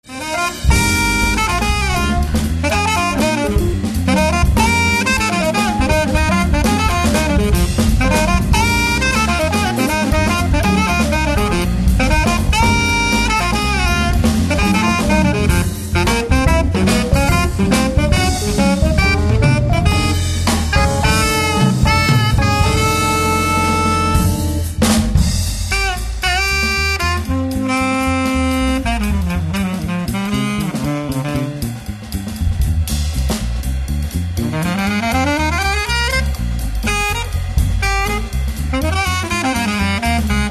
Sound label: Jazz Atmosphere